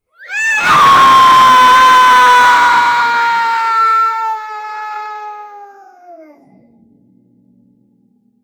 falcon attack cry sharp

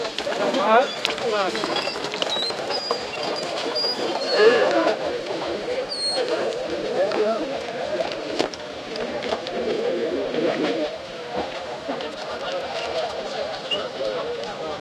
the muffled thud of, animal

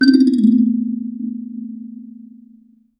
a single resonant pluck, musical instrument

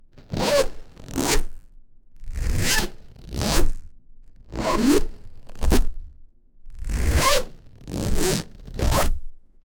deep gritty rope sliding; textures

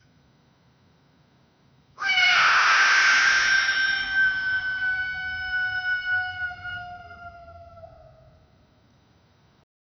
falcon attack cry sharp

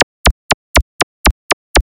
rhythm game attacksound

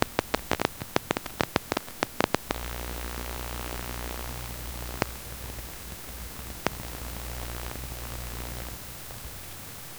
low level broadband hiss t, electrical

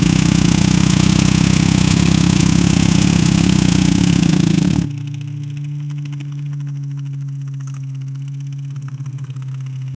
motorbike engine revving

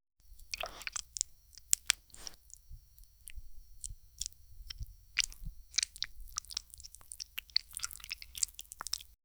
asmr sound of squishing, liquids

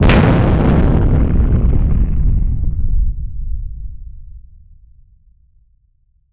fart explosion massive

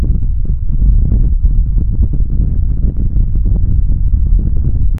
subtle hum of ambient stadium

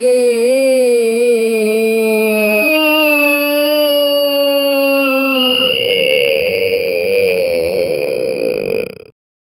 effect for a game short, goblin

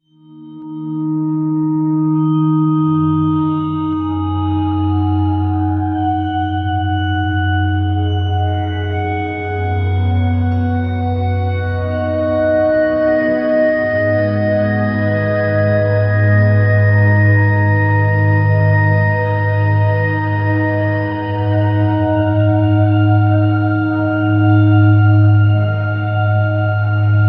evolving ambient textures, bells

